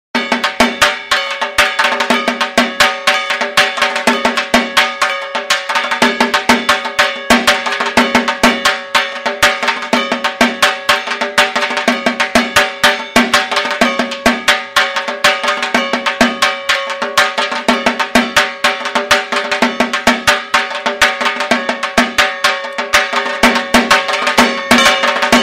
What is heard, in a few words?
Navratri Music Ringtone